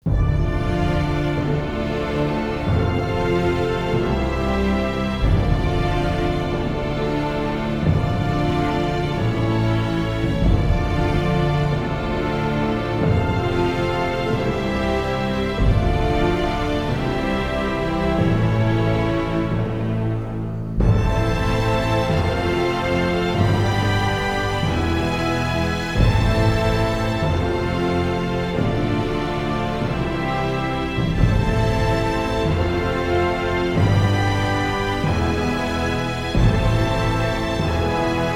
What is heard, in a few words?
Orchestral Only; трейлерная музыка